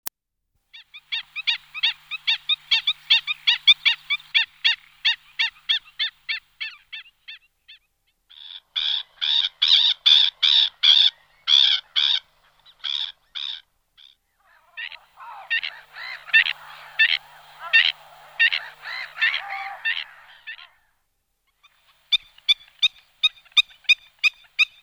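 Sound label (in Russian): hodulochnik golos, black-winged stilt voice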